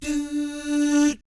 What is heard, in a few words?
DOO SFZ, Vocal Jazz Fx